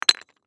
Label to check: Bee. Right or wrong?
Wrong